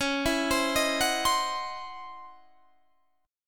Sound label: C sharp Minor Major 11th C sharp Guitar Standard; C#mM11 arpeggiated; 21,19,22,20,19,20; JGuitar